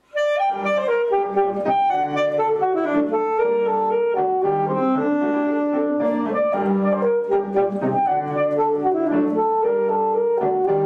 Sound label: Sonata; Solo Accompaniments; Alto Sax; Eccles - Sonata